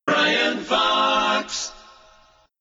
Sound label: jingles logoset accapella; priority one